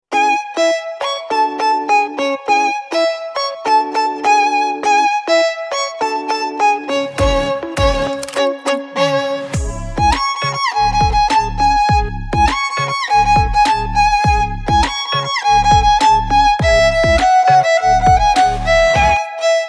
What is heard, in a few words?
violin cover